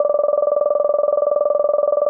Result Count

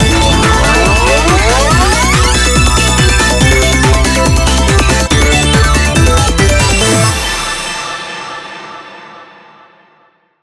BonusWin; Tippin Bloks; TUNE Bloxx BonusWin

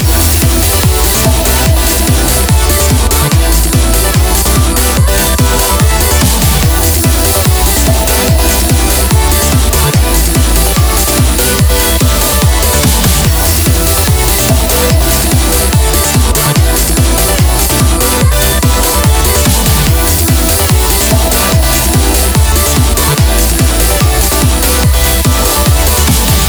Title Screen Loop; beatmania IIDX 29 CastHour; System BGM

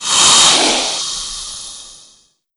Spider Die; Wild West Shootout (Arcade) (gamerip) (2019)

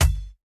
Weapon Rubber

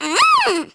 Kid; Waterski; Neighbours From Hell Console Edition; GC, Xbox

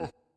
Hover Hands

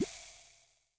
Tech Bubble Fizz; Trial

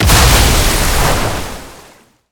Tidalwave Explosion; gamerip; Se Sp Tidalwave Explosion

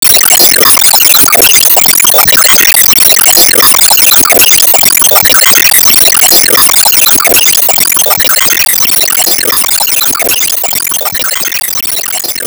Enemy Shellwizard Active Loop; Disney Star Smash; Android, iOS, Mobile

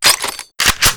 Reload Bullets; Wild West Shootout (Arcade) (gamerip) (2019)

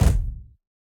Boss Footstep Base; Going Under; Avie

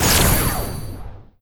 Player Pain Damage; Disney Star Smash; Android, iOS, Mobile

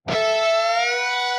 Guitar Cheer; ash adams gobsmacked

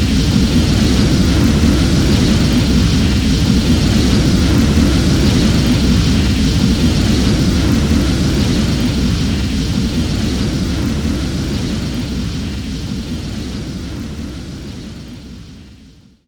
Enemy Big flame Active Loop

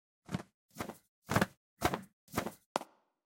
Fly in; Mamepato

